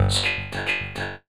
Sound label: FX VOX; AMG - Vince Clarke Lucky Bastard